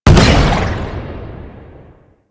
Arachnoid Camper Missile Barrage Explosion; Warframe; Corpus

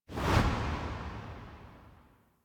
Corpse Inspect Out; Warframe; Cephalon Weave

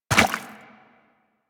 Land Goo, Footsteps; Warframe; Tenno

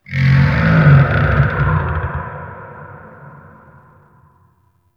Mystical Tree Creak, Wetland Forest Day; Ambience; Midrath

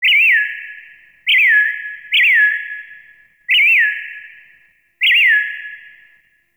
Terrarium Bird Chirp J; Warframe; Ambience; Entrati; IntTerrarium

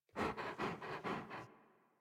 Kubrow Tame Interact Sleep Pant Slow; Warframe

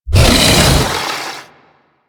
Wolf Charge C; Warframe; Grineer

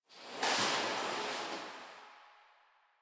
Grocery Cart One Off; Warframe; Ambience; Nineteen Ninety Nine; DE

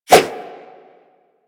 Nunchaku Whoosh, Weapons; Warframe; Tenno

